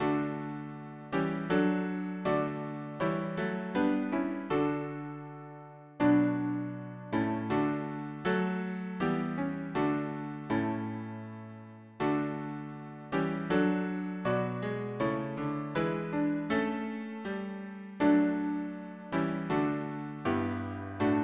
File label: harmony piano